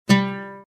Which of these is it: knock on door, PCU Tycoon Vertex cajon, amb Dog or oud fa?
oud fa